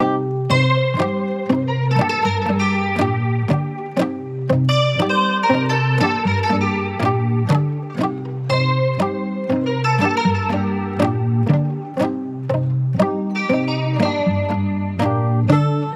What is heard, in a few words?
120 BPM Gm